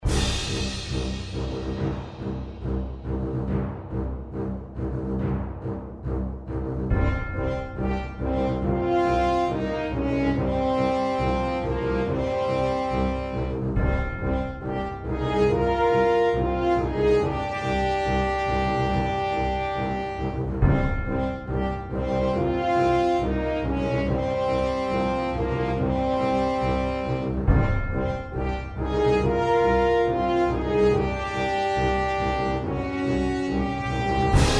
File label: March; March of the Empire